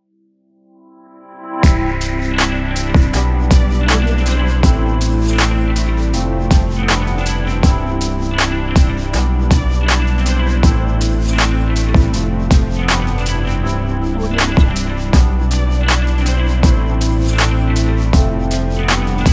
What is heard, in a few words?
background hiphop